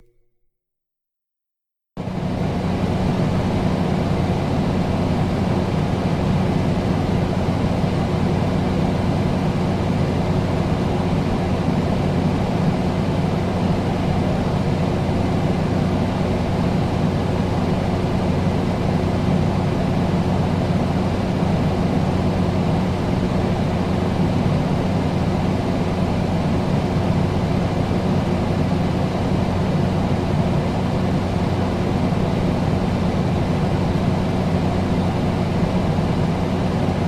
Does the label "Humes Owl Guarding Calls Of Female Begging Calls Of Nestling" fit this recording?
No